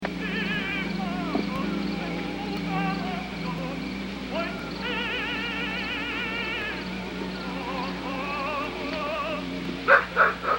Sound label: Victory